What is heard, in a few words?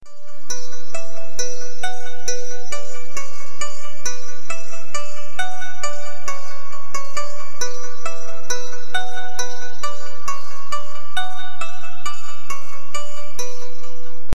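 Loop Horror Synth Harp Bm 135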